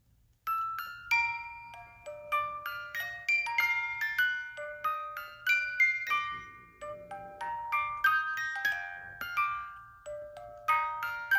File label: 18 lames, boite a musique; Boîte à musique en bois personnalisable